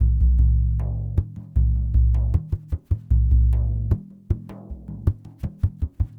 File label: Lg Frame, World Percussion